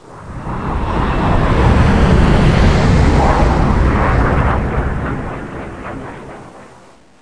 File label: Amb Passenger jet